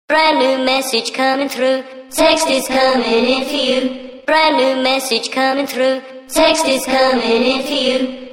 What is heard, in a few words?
Brand New Msg